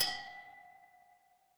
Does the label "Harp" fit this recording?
No